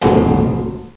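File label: warning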